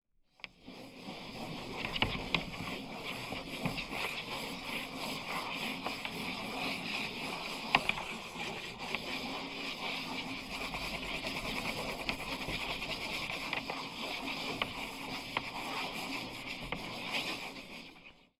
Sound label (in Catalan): Escriure